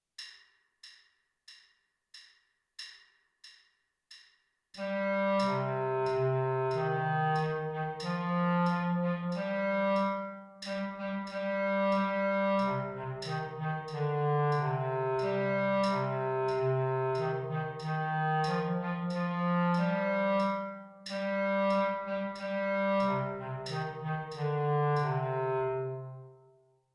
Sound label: bass clarinet